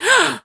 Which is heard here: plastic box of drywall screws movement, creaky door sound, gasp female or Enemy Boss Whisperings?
gasp female